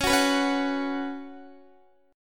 Db 7th Suspended 4th Db down Guitar; strummed

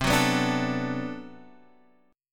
B-Minor 7th C

